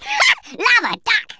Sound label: laugh; Worms Armageddon; Geezer